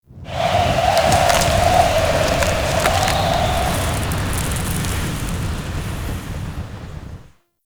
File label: Settlement Wind Debris One Off; Warframe; Grineer Settlement